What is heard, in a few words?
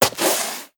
Stone Turn; moul-assets